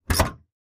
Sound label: Ford LTD hood and trunk open and close. Vehicles, Sedan Sedan, Car Open, Close